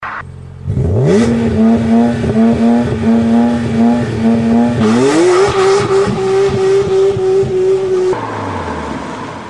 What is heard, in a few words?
Bmw M3 Kompressor